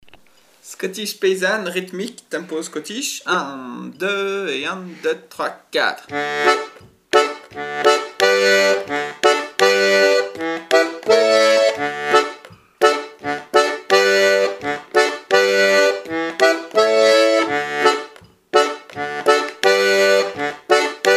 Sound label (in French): scottish paysanne rythmique; L'atelier de la casserole